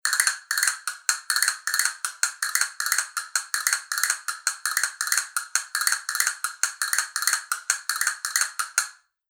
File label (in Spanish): castañuelas fibra concierto